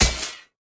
wall of spears wall damage; smite; odin

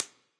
Clap; smite; bakasura v2 skin8

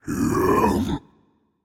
breath, voicepack; smite; jorm

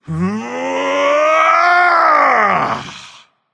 battlecry; smite; anubis

